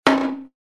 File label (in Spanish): juguetes juguete